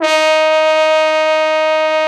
TROMBO; Trombone

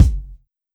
Kick, Drum Hits

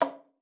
KLPA C#4, ALTOSAX; Xsample VOL-2

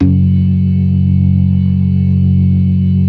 ORGAN, 4 DRAWBARS; Keith Emerson Signature Series

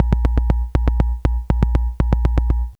ARP SEQ 120; AMG - Vince Clarke Lucky Bastard

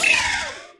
SCREAM

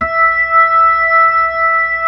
B-3, Keys of the 60s and 70s

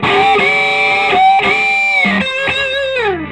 SOLO, Vintage Blues Guitar

